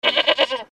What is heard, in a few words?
goat sound BAAA